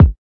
kik

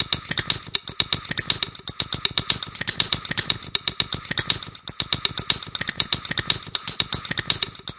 120 Found Percussion, Drum Loops and Breaks; 074 to 128 bpm

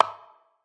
bigspace perc, Big Space Percussion; ETHNIC and WORLD PERCUSSION

